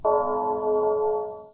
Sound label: bell